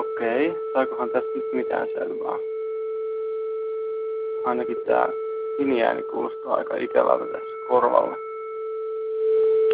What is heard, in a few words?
openmoko noise